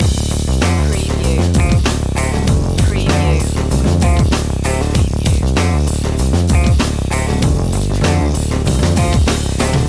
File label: drum loop